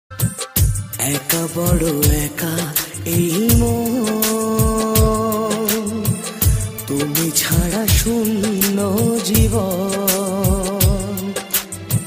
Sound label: Bengali Ringtone